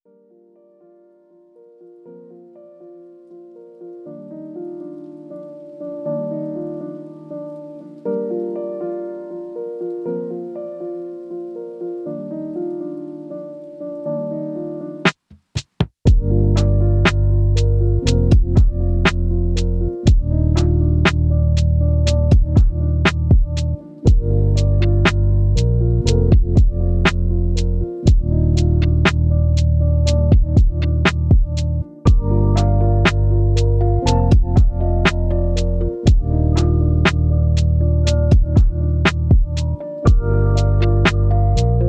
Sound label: lofi hip hop